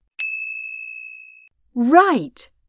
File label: correct